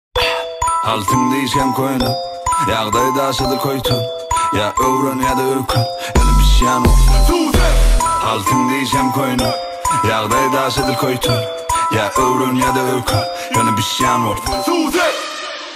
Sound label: remix trap